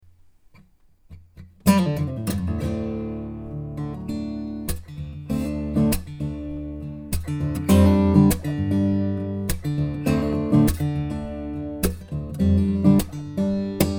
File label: stereo guitar; lewitt; lct040match